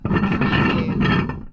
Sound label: Metal Chain Rubbing Short; industrial machinery; lynne-publishing